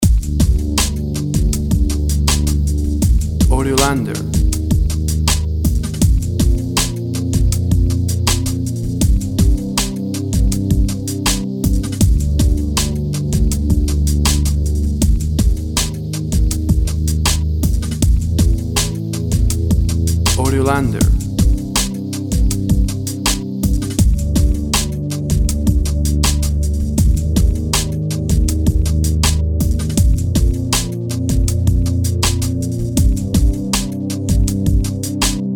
Frecuency dark

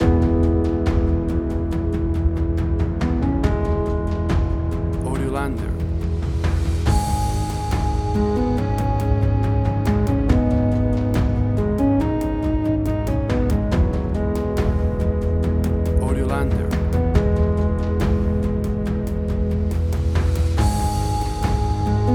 Atmospheric Slow Tension; 30 SEC; AUDIOLANDER

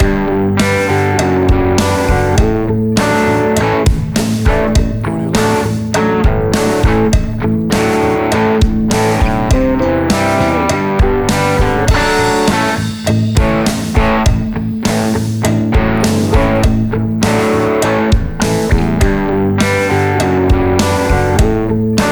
Big Old Rock

